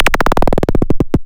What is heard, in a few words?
Moped; Cwejman S1; DevSnd Cwejman S1 - JK